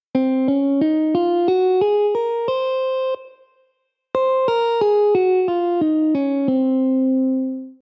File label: Locrian; scales